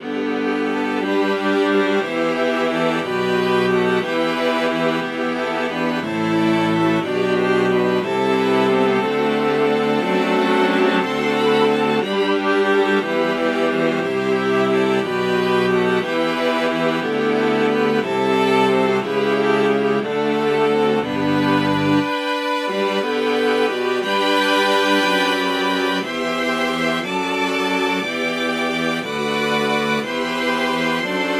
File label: viola